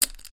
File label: undo